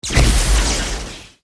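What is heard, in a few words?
spell magician lightning bolt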